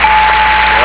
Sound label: ding